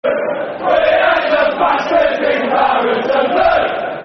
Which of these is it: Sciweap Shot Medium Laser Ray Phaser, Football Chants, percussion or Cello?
Football Chants